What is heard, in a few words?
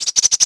birds; World of Tanks Blitz; hangar 9 may